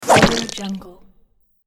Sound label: sword hacks into flesh; sonic-boom